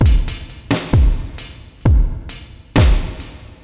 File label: Octave Down Loop 130BPM, DrumLoop Ghost Layers